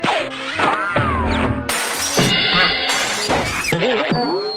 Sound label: clock fight; doodle toons